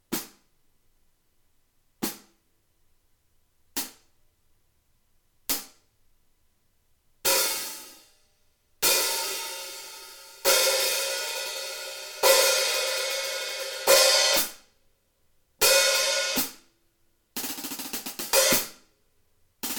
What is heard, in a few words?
Hi hat 14; Hitites; Amedia